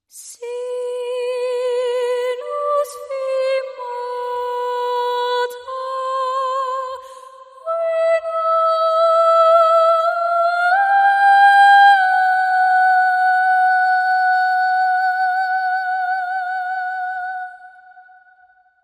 Solo Voice

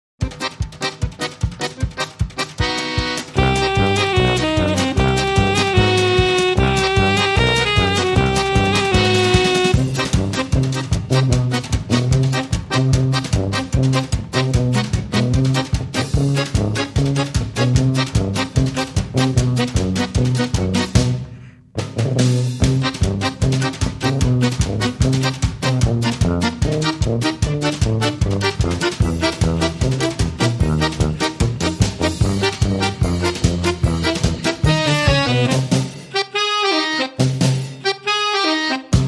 Balkan Beat

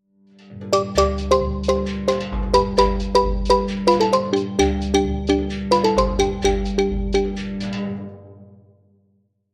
Hard Dance

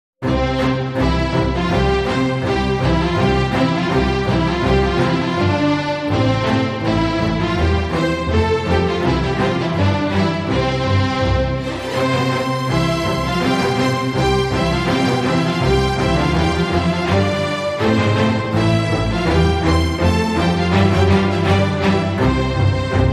Strings Only

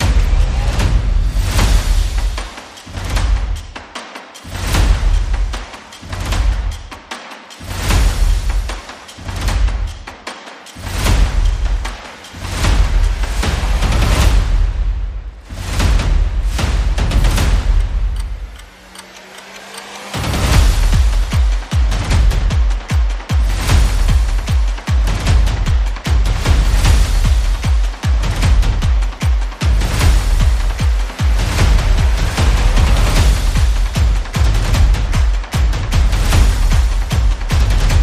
Percussion and Effects

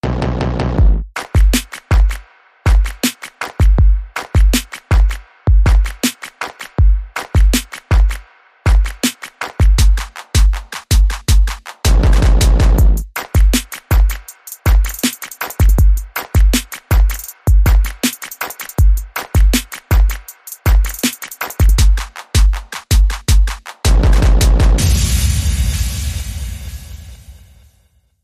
Trapping Beat

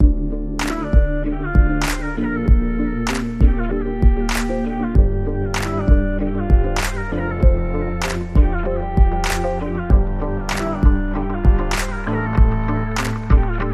Out Light Beat Hook

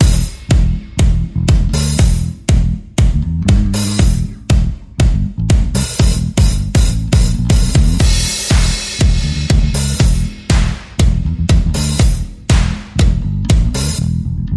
Percussion Bass and Effects

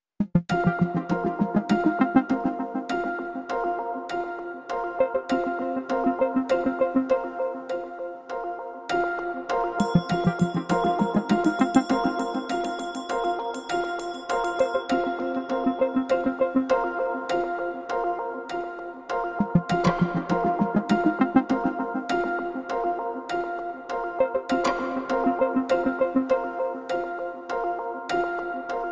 Medical Precision Underscore

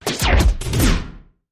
Big Noise Pounder

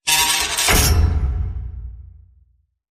Creaky Hit

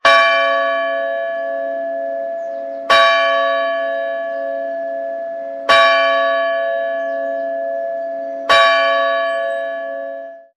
Church Chimes

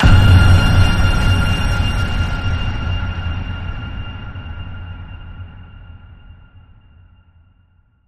Ping Frequency, F

